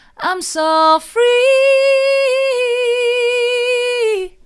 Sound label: mid, vocal